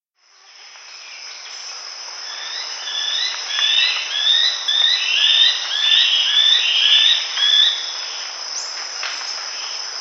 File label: Long-Winged Antwren; S. America